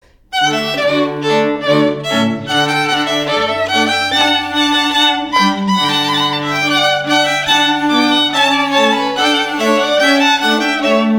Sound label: Rondeau from Water Music Classical, String Quartet